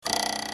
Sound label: twang